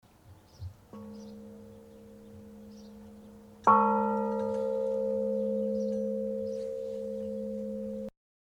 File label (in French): cloche; Chalivoy-Milon